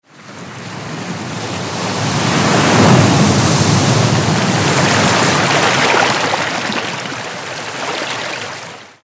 Rythms of the Sea Crashing Waves on Rocky Point

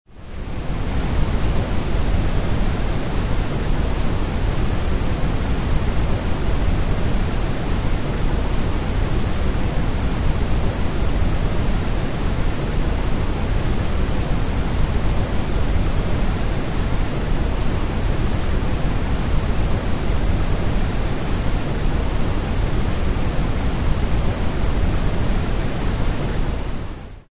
Brown Noise

